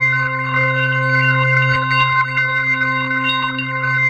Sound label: obelisk loop; Arka; Acheron